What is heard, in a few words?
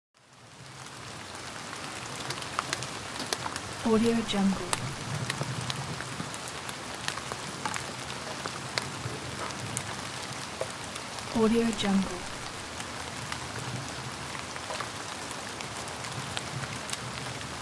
light rain